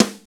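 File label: snare dry, fast rock, Roland; Northstar; Drumscapes Roland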